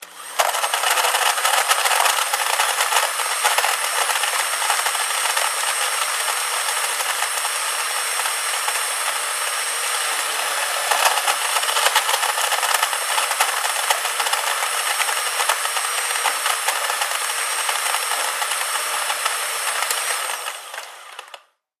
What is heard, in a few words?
Blender Machine Run; appliances